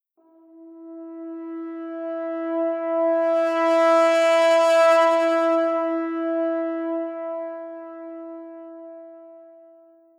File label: Changes in dynamics